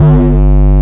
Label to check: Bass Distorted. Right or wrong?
Right